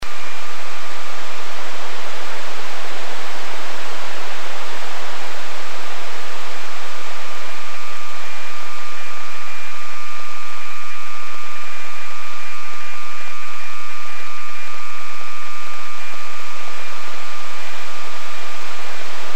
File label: beacon, amsat